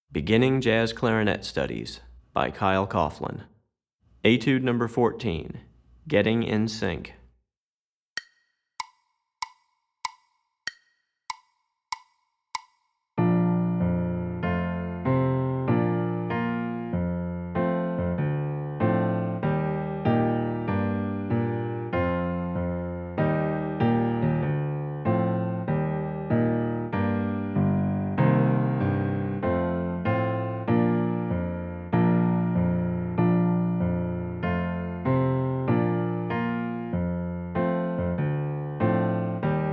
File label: Piano; Recordings For Beginning Jazz Clarinet Studies, Volume 2; Piano Accompaniment for Etude #14; Syncopation